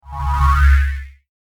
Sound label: System Accent